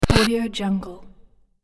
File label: cartoon crow hit